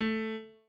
piano

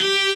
cello

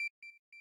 arp

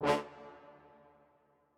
strings

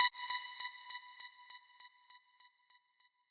lead

